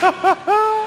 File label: a minute of laughs; jimmy carr